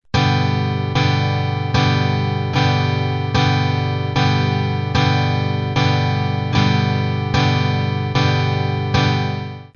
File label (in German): g dur akkord